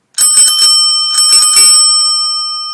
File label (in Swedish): Ringklocka cykel